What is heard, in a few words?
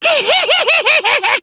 laugh; terumi